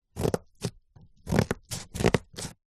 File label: office scissors cutting cardboard